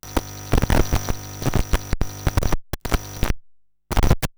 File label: Noise